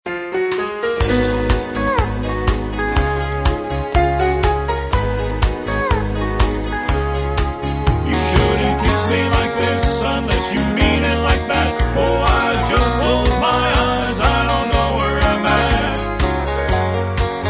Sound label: harmony